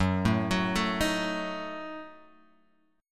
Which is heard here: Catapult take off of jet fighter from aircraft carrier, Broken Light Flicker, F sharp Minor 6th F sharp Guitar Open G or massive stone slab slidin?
F sharp Minor 6th F sharp Guitar Open G